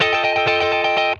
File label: F JANGLE, Funk Guitar; Zero G - Funk Guitar